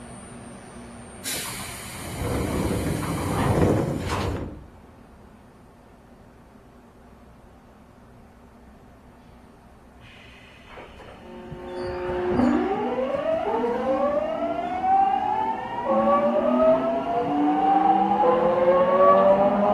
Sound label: vvvf, mitsubishi; odakyu 1000